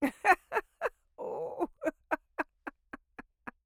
laughter, female, 70s, Baltimore, fry, slight burst, ooh, chuckle, taper; Eiravaein Works